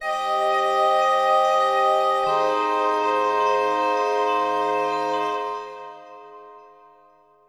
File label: synth pad ambient 160 C; Sphere